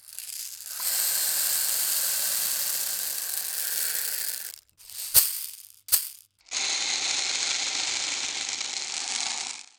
percussion fx

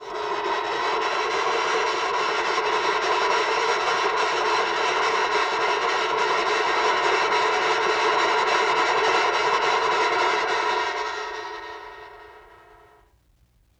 LoFi Metal Scrape; Vintage Cartoon; Foley

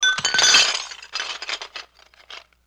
Pickup Dishes Tablecloth; Vintage Cartoon; Foley

